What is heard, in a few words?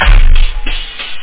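bass drum + hh; shockwave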